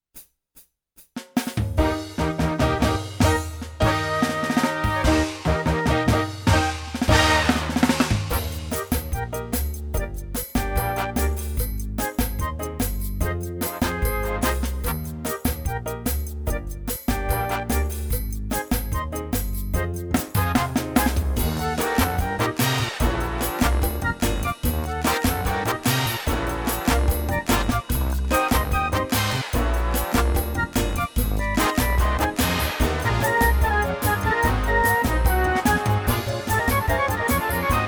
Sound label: Korg Pa1000 Style